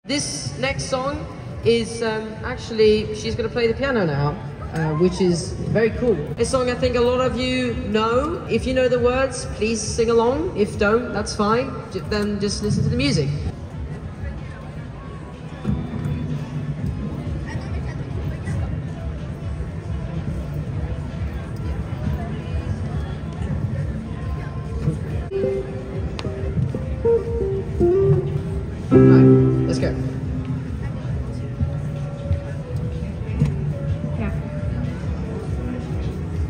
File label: piano on the street